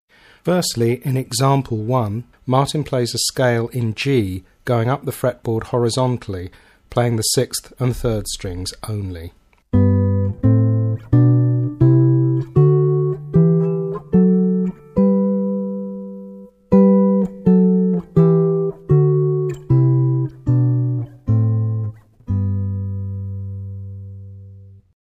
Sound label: G Scale, Guitar